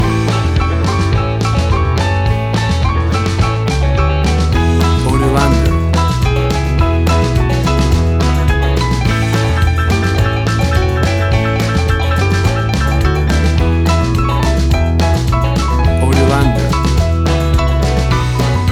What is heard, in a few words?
1950s Rock and Roll Country Shuffle